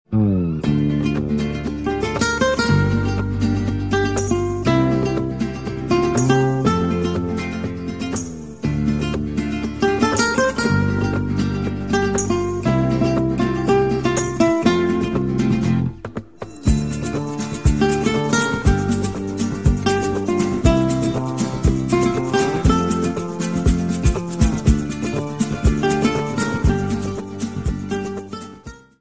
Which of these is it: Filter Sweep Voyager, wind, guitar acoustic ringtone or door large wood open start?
guitar acoustic ringtone